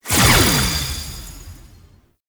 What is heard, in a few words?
Shield Cast; Warframe; Sentient; Adis